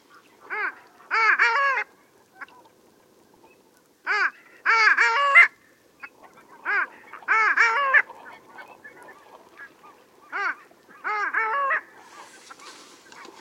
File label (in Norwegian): HAVELLE HANN CLANGULA HYEMALIS